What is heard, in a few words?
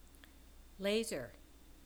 Laser